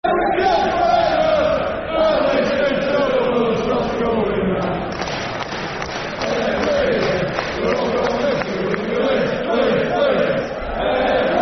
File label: (Longer) Football Chant (Soccer Song) Leeds; fanchants; Leeds Lufc